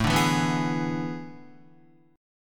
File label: A Major 7th Suspended 2nd Suspended 4th, Guitar; AM7sus2sus4 strummed; 4,5,4,4,4,4; JGuitar